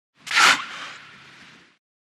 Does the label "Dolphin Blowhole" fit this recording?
Yes